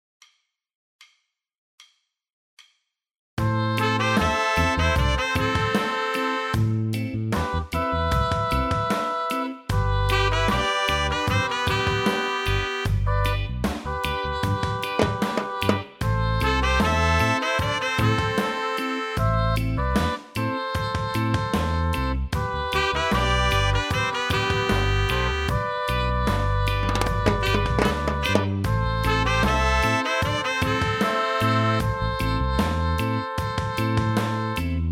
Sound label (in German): Reggae NO Gesang